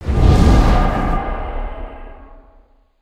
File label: Wind Leap, ambience spaceship; Starbase